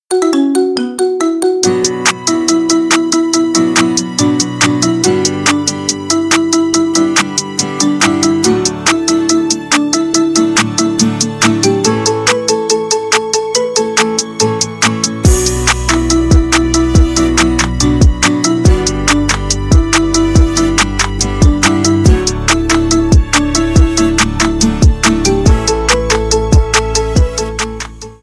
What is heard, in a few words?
marimba remix